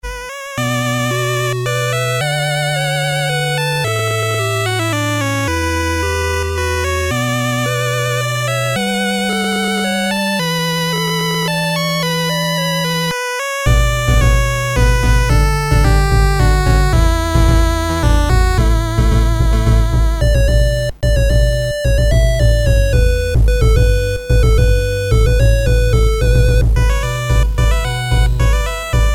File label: skyrim 8bit long